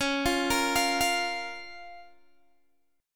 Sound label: Db 6th, Guitar, Open G